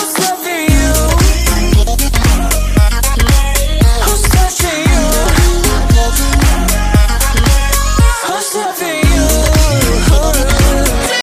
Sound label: hot electro